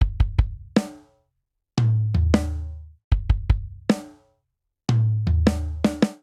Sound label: Grunge Rock Breakdown 77 bpm; 4 4; MIDI Clips; Ableton; Factory Packs; Drum Booth